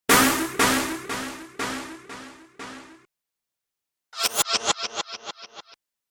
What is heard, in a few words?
Dubstep Scifi Effects